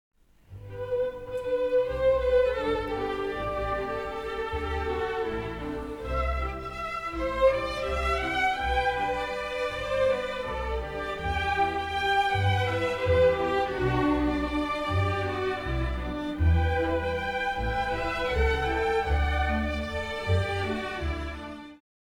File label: Waltz